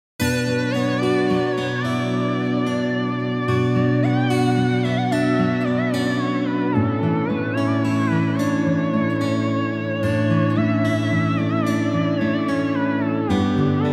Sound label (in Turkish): klarnet cover